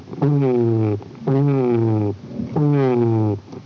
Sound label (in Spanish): macho sirenas; エンヤ ツノゼミ、オスの警笛２; 録音 ヒメナ・ミランダ